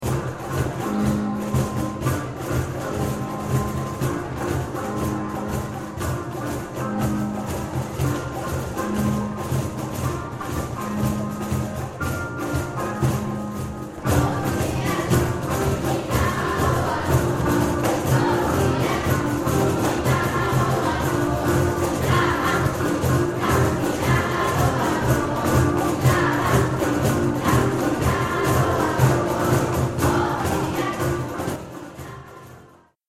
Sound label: Green Drums